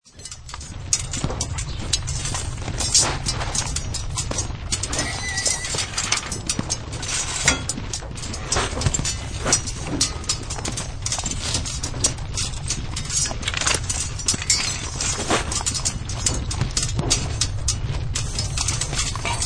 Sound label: Warfare Medieval Battlefield Weapons Horses Close Perspective; Battlefield Sound Effects